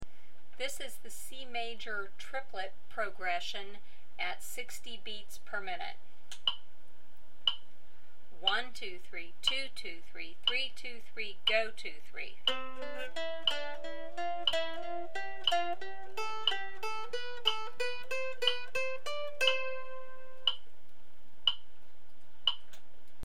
C Major Triplet Progression 60bpm, mandolin

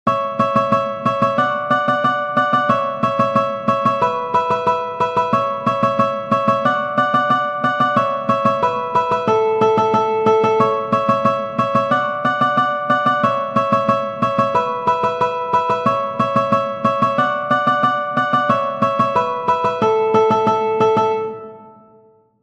Advanced Exercise 180bpm, mandolin

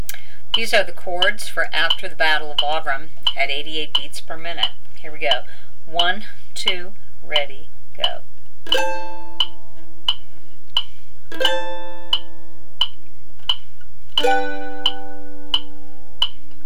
Chords 88bpm, mandolin

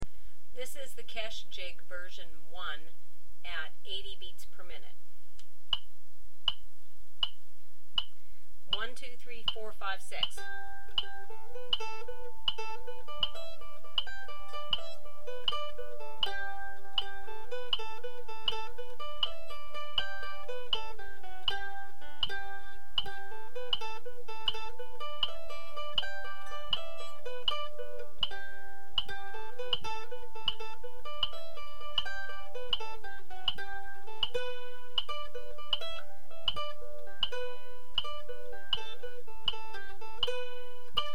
Kesh Jig 80bpm, mandolin

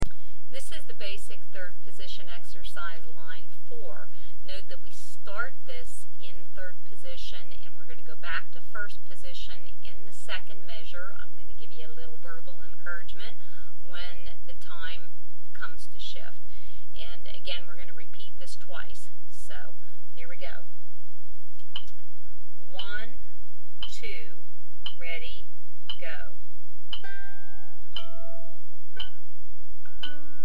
Basic 3rd Position Line, mandolin

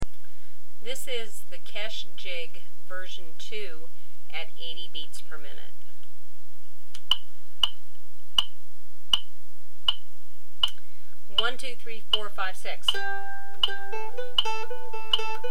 Kesh Jig 80bpm, mandolin

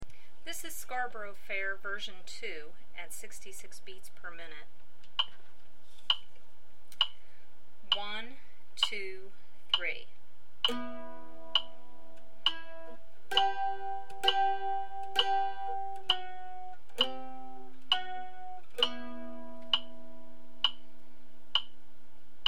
Scarborough Fair 66bpm, mandolin

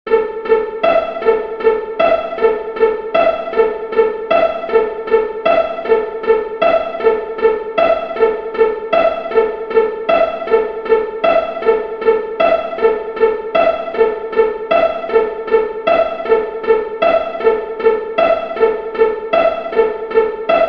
Crosspicking 80bpm, mandolin

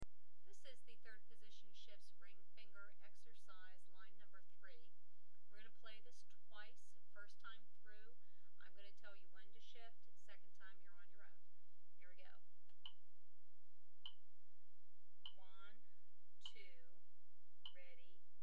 Third Position Shifts Ring Finger, mandolin

